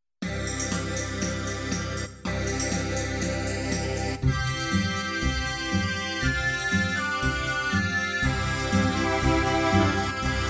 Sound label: Musette in C